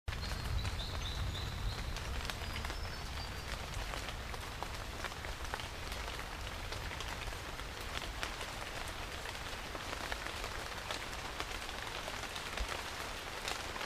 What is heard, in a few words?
rain forest